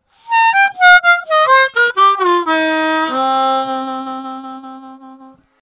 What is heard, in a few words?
Honey C Blues Scale, harmonica